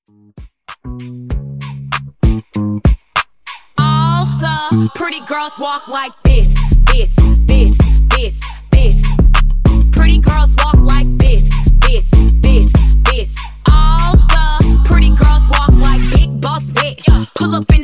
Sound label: Mashup